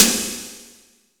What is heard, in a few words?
IND